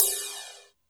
Cym 60's Flange, Drum Machines Alesis D4

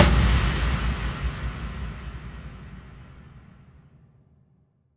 Impacts, Effects

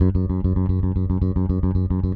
Sixteenth Notes F#, Bass

